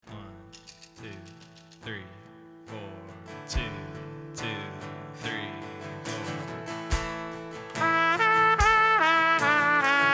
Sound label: Lead Line, praise